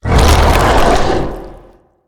Limb Loss; Ravager

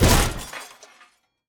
Wulfrum Death

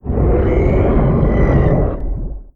Reaper Enraged Roar

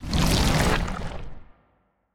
Slime God Possession

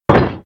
Leg Stomp

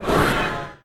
Death

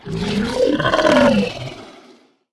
Desert Scourge Roar

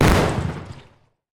Plague Boom

